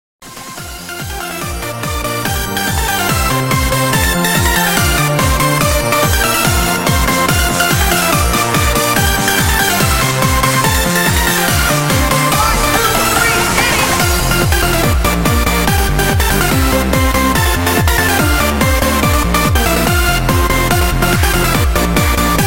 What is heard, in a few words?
trance x mas